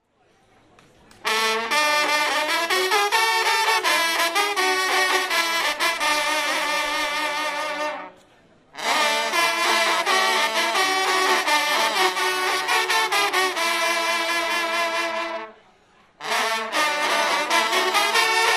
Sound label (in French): Le départ pour la chasse